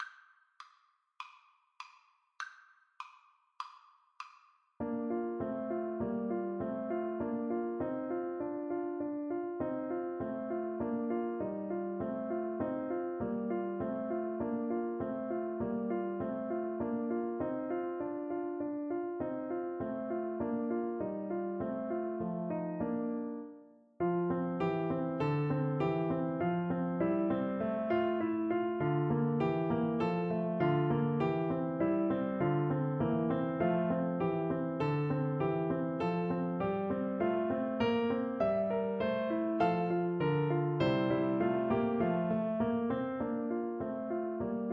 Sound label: humming song, clarinet; schumann